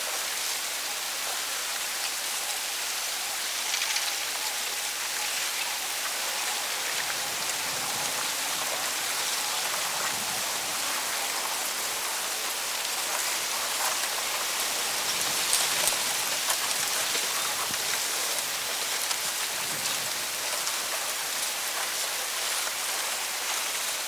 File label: wind in palm trees; OpenValley